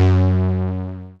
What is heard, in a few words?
505, String Machines